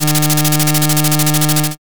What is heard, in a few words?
slot spin